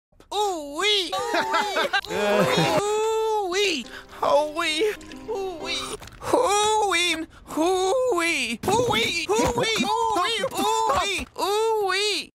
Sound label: woo wee weee